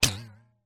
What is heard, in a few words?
comedy impact element spring hit wobble; zapsplat